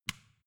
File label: switch small turn on